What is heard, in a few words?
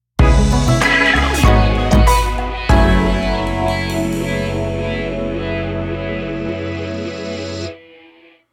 Funk